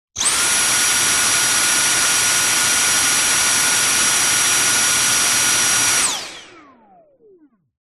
household vacuum cleaner dyson v7 stick short burst close up motor